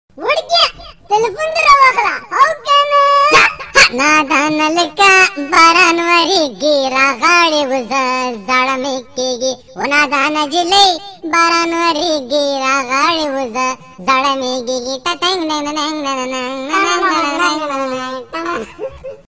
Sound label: pashto ring tone